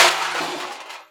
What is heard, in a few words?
metal plate hit